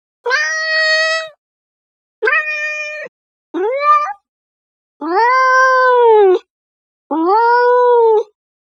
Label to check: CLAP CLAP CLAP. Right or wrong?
Wrong